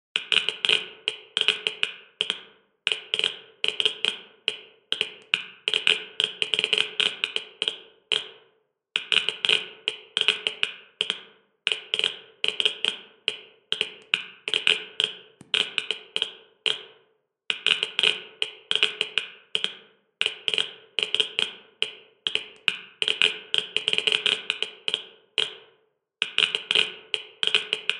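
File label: Geiger counter, radiation meter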